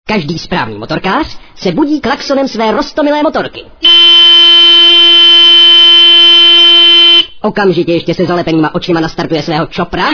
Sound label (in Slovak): budík